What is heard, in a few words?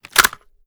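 assault rifle reload empty mag out; gmod; viper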